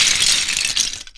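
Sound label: debris large; LEGO Star Wars Force Awakens; Junkbot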